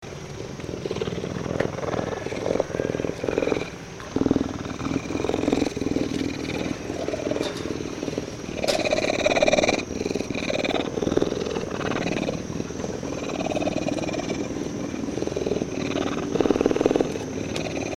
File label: Puma Purrs; Recorded at Zoo Ave, Costa Rica; Kuma Sounds